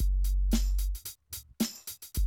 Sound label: 110, Classic Drum Loops